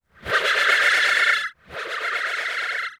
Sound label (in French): CHEVAL